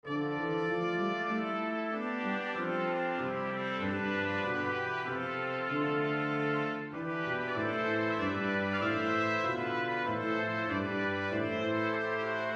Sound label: Brass Quintet; Liedboek; Heerlijk verschenen is de dag